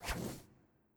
Godess Sceptre

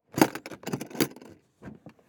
Toolbox Open; Monster Jam Steel Titans

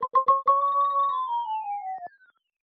Musicbox

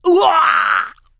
Enemy Generic Human Death Crushed

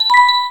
Combo; machi koro with everyone; switch windows

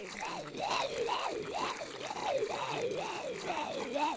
Mage Blob Walk Loop

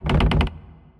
Wood Creaks

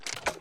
Purple Lever Off

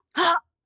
Ruins Flying Sentry Attack; Ruins Flying Sentry Attack 01

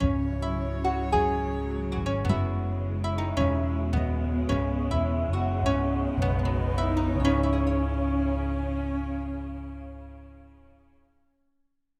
True Ending Music

